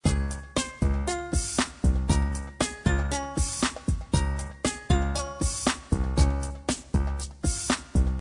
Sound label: BGM loading; slots; Sushi Suprise